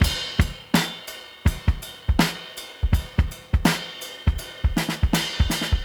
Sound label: Live Jungle Breaks